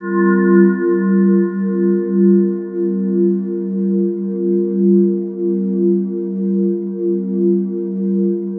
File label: ambiant, special FX twisted; USB Soundscan; AKAI